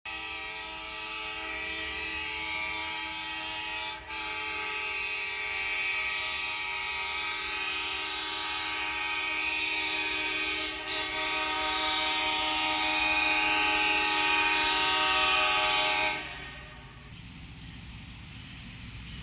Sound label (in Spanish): pito de un tren